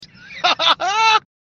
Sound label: ha ha haaa